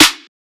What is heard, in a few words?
snare smash; one shots; SEISMIC Drum Sample Pack; Slate